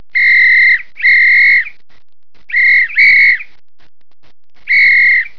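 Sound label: pol whis, police whistle; 8bit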